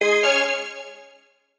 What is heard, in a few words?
luxury gift